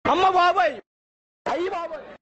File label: Notification Sound